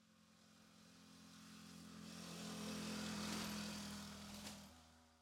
moped; wild bazaar

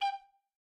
flute; easter egg; golden tavern

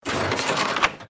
free Spin Transition; mythic maiden

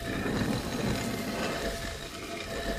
reel Spin; jungle spirit

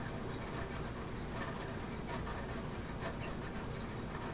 reel spin general; spinsane

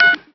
Spin Button; knight rider

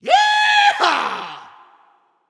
yihaa; dead or alive 2

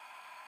reel spin; dracula

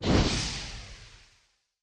lamp hit; halloweenjack

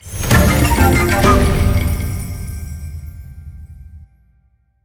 winLarge; templeofnudges

